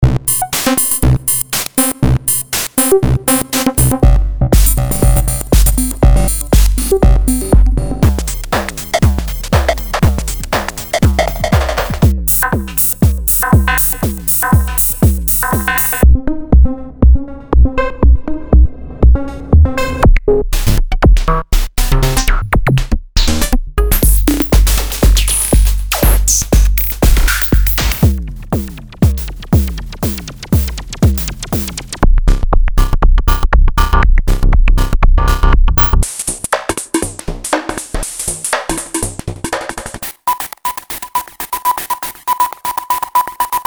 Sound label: Drums